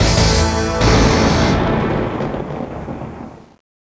Stage Clear 48k 1shot; yakuza ryu ga gotoku kiwami 2 pc gamerip

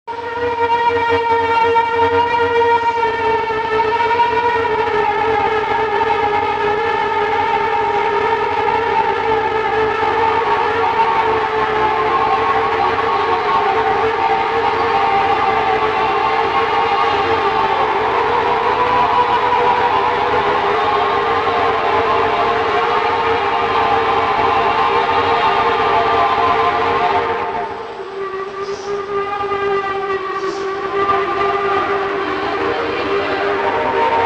Radio; Milk outside a bag of milk outside a bag of milk

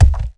Cube Fall; 7 Wonders of the Ancient World

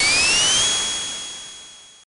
Spin; donovanni the vanni ver .4.2 2021

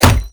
Click

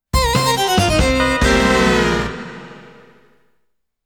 Defeat Jingle; Mitsumete Knight; psx

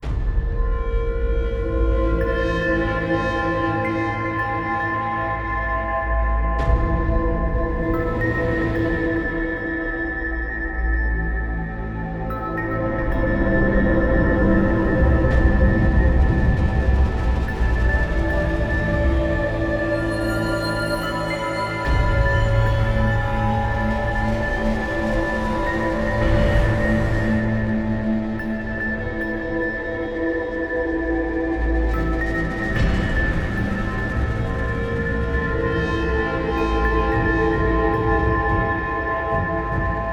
Survival Fight Ambient

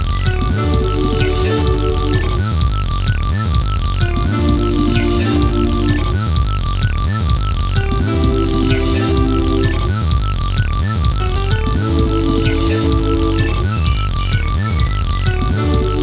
Results Display; Namco Game Sound Express Vol.19; Mach Breakers

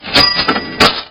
Cash; Dungeon Marathon

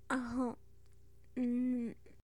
Sex Lines Kiss open; Hilzartov; Fairy Size Doesn't Matter

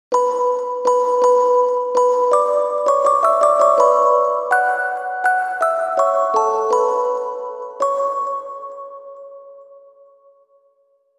Music Box; Grimoire Song; Rune Factory Frontier

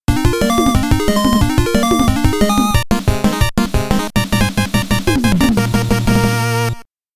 All Stage Clear BGM; Magical Action 4; Wai Wai World 1 and 2 Sound Collection

